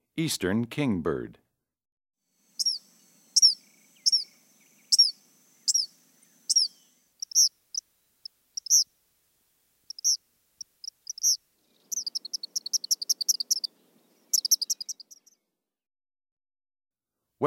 Eastern Kingbird, Western Kingbird, Gray Kingbird, Great Crested Flycatcher, Eastern Phoebe, Bird Songs; Eastern Wood-Pewee; Bird Songs Eastern-Central